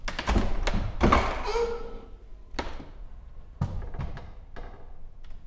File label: Ancient File Cabinet Door Opening